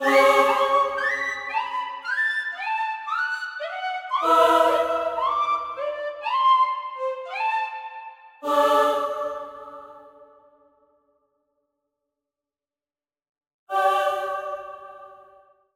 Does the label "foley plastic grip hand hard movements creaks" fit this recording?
No